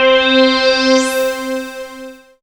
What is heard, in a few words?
syn c, Dance Synths, Juno; Juno Jam